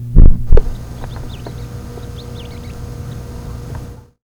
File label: Mystery Bird Call